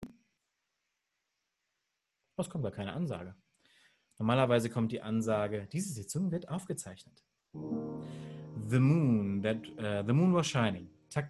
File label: bass solo langsam